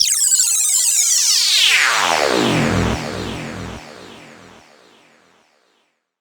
Zap, PsyTrance

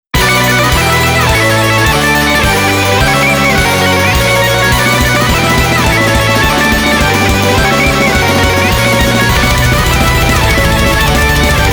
BPM210, Instrumental; 上海アリス

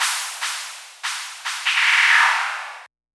Cymbal, PsyTrance

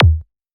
Kick; LiLA'c Records PsyTrance Sounds 01

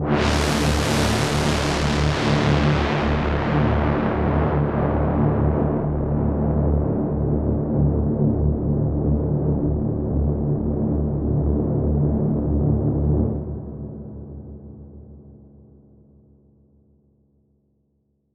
Pad, PsyTrance

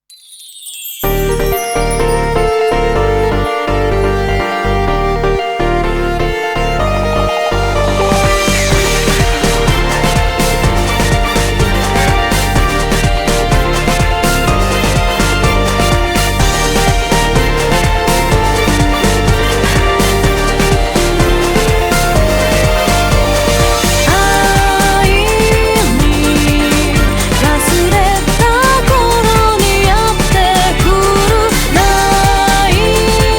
Ambience Dist.

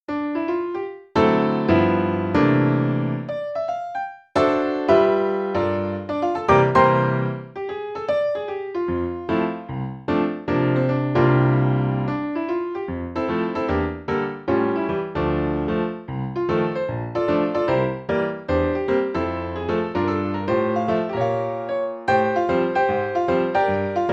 Piano Var.

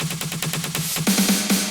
Percussion Fill

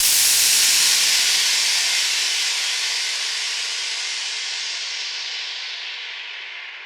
Down Lifter

